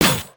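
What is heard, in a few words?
Attack Spark Effect; Dragon In A Box; destructibles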